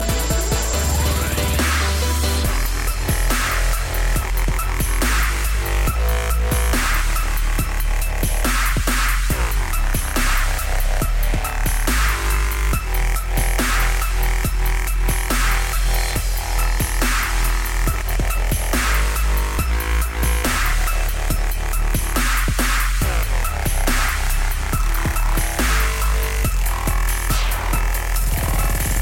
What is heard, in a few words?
Dubstep; Lunar